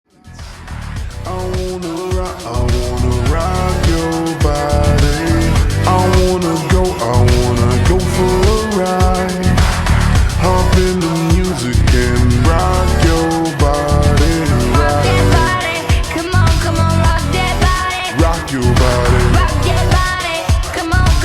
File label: slowed reverb